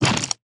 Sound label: mined iceberg, mining; Factorio